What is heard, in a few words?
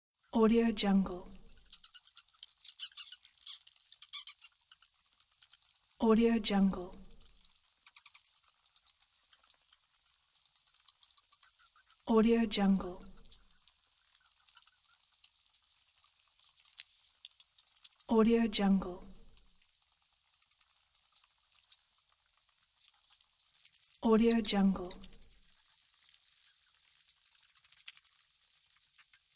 cartoon bats; nature sounds; صدای خفاش